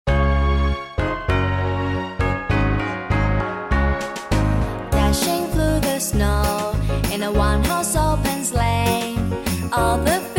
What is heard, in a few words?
jingle bells english